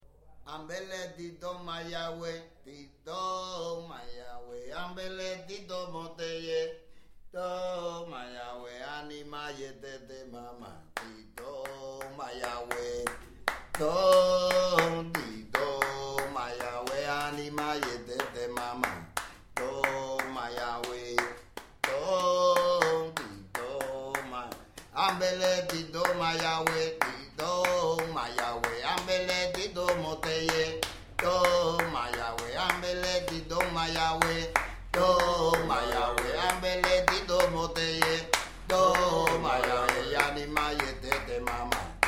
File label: canto solo